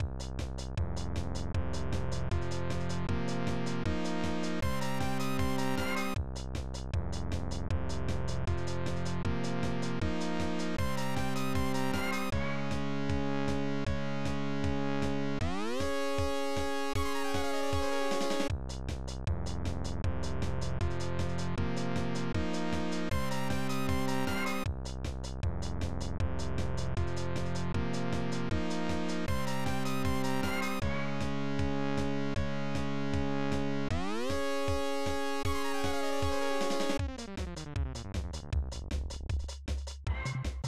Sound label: Level Music; Clock Works; SNES